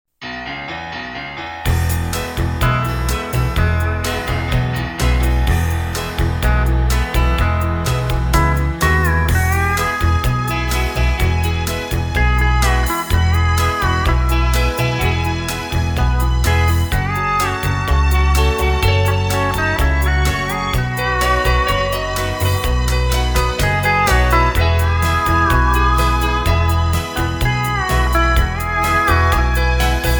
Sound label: music